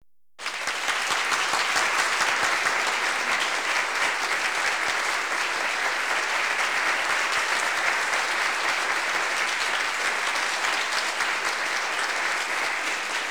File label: Audience Applause